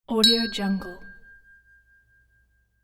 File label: glass ding; hollywoodedge